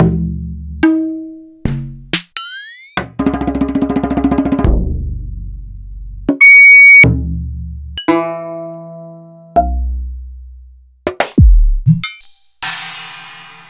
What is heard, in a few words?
EMU Planet Earth